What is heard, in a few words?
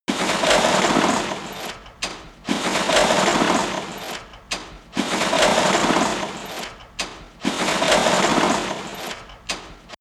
industry machine mechanic dense slow